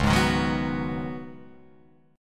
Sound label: C sharp 7th Suspended 2nd Suspended 4th, C sharp, down, Guitar, Open F; C#7sus2sus4; strummed; JGuitar